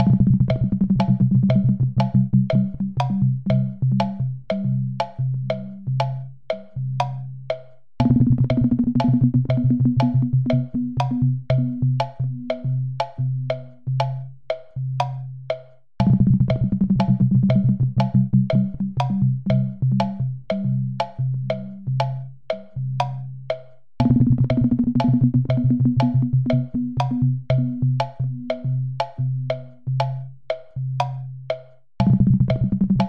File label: BGM ticktock